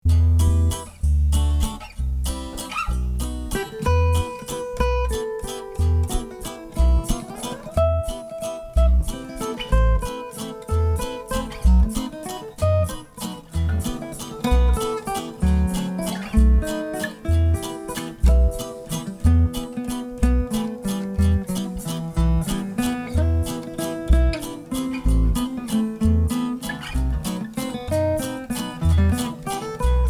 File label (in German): Spanische Romanze